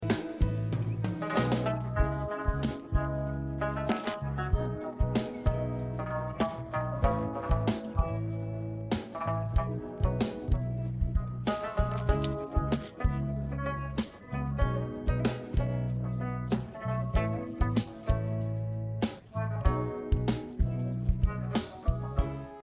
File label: no vocals